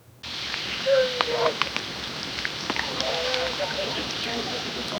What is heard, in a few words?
gentle breeze soft rustli